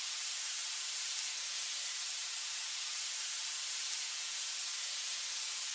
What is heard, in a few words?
loop steam